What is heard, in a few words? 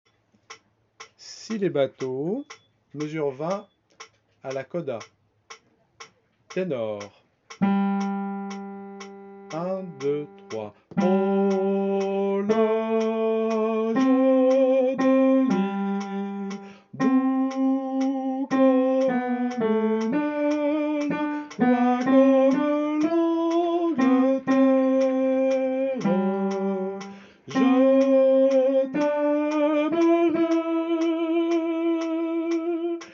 coda tenor